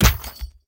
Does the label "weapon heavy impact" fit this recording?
Yes